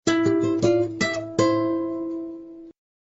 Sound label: guitar simple